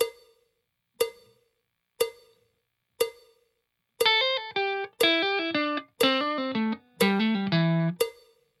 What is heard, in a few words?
blues tricks slow, guitar